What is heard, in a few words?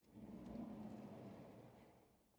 drawer cutlery draw out slow